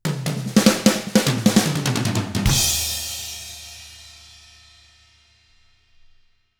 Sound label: SHUFFLE, Drums